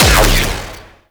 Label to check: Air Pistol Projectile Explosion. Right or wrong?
Right